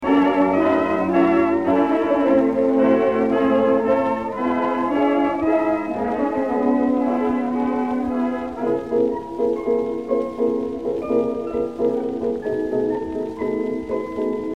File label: acoustic; Rhapsody 1924